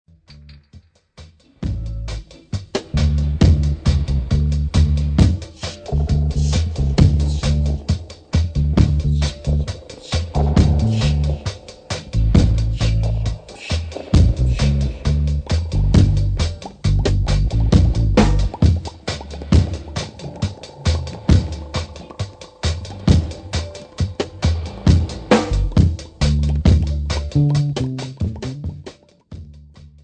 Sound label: Drum Rock